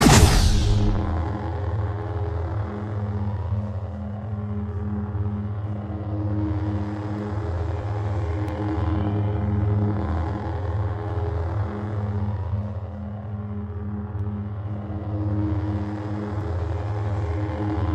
saber ignition

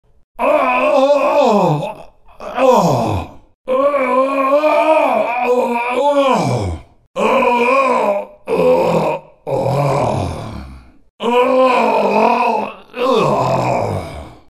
dying; volibear

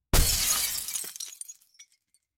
glass broken plastic

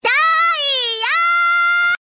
incorrect

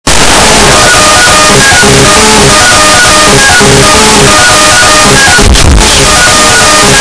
loud phonk beat

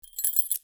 keys

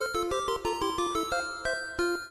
pool win; pika

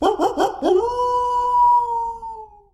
howl sfx

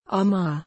amargh

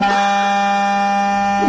boot; haralds

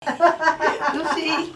hihiahahaha

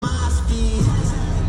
moshpit

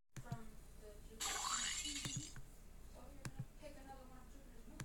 splat crash

